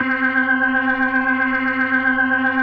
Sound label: C4F, B3, Vintage Keyboards